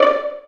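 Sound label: Vio pizzicato D4; Digital Sound Factory Virtuoso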